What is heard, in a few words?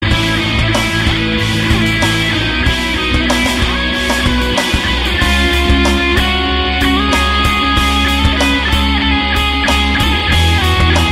Ringtone Electric Guitar Solo